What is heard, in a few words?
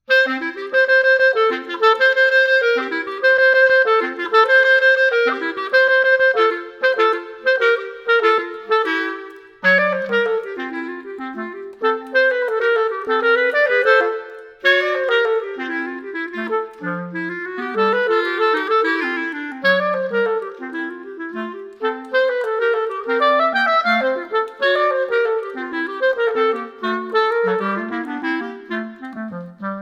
bebop samba, clarinet